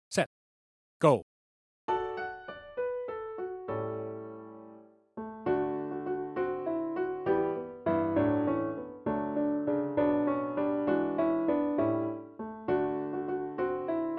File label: 67bpm; tmea solos